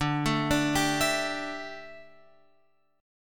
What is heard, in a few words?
D Suspended 2nd Suspended 4th, Guitar, Open F, chord; arpeggiated